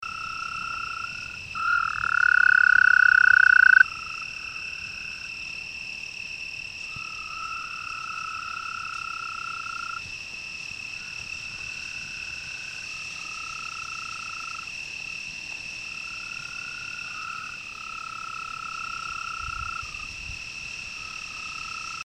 Bufotes sitibundus

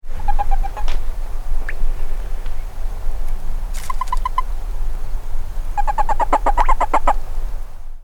Anaxyrus boreas halophilus

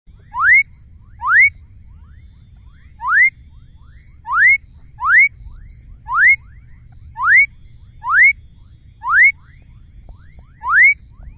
Leptodactylus fuscus

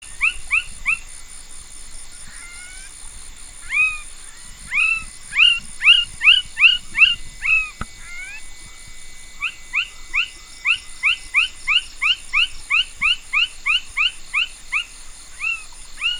Pulchrana laterimaculata

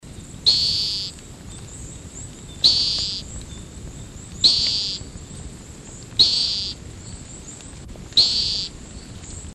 Gephyromantis thelenae

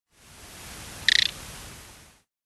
Mantidactylus lugubris

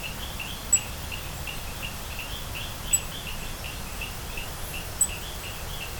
Stumpffia miery

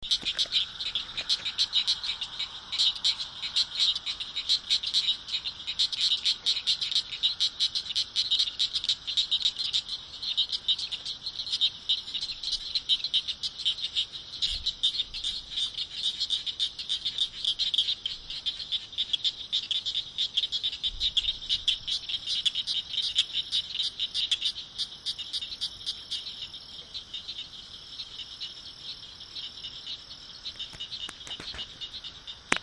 Acris gryllus

